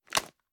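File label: pistol reload fast mags end; gmod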